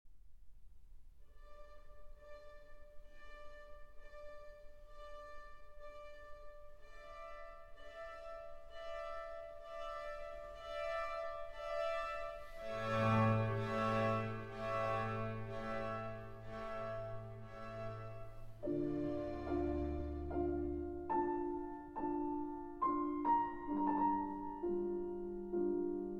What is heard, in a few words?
Keyboard Concerto in D Minor, Adagio